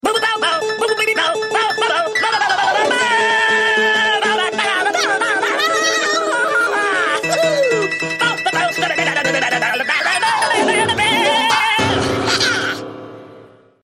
Crazy Piano Ringtone

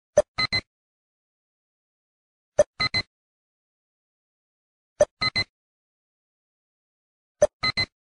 chirp tone; The Unit

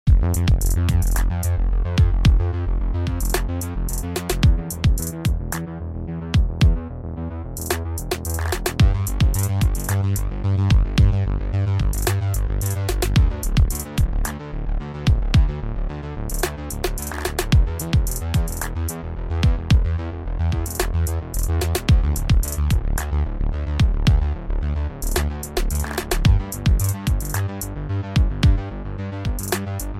Synths

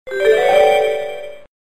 Cute Tone

